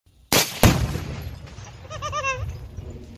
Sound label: Boom cackle